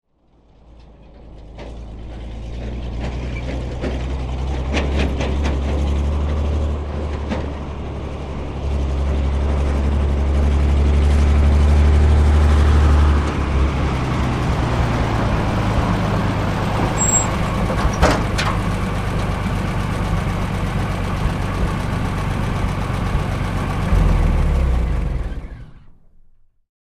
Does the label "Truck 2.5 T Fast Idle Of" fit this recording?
Yes